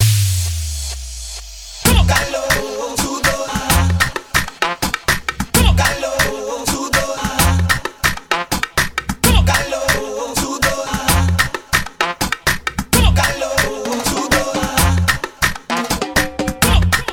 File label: GUARACHA SANDUNGA 130 BPM